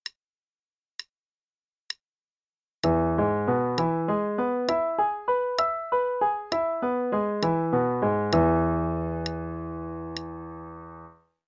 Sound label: Arpeggios, E Major (qn=66); CM Level 6